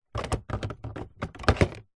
household drinking glasses new slide in or out of box